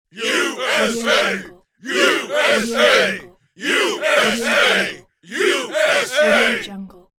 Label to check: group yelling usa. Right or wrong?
Right